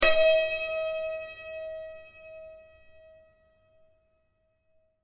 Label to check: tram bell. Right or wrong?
Wrong